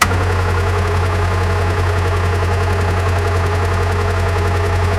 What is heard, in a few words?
diesel